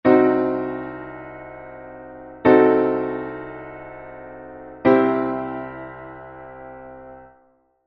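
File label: diminished chord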